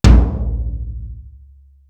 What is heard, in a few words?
POWER TOM; East West; Global Sounds